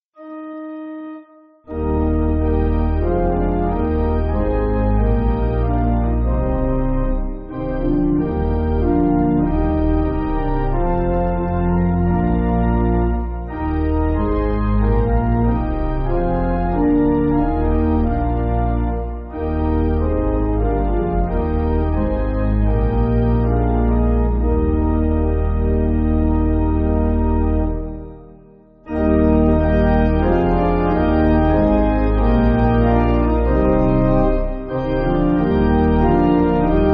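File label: Pipe, Pipe Organ, Backing Files for Churches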